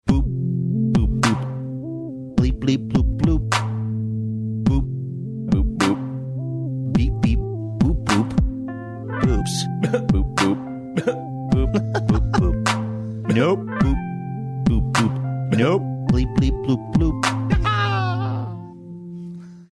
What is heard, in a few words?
listener beeping booping song